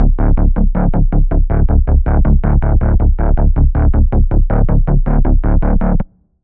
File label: Pulse; Routed Sound; Serum 2 Expansion - Quantum Enforcer Vol.1